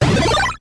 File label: game sound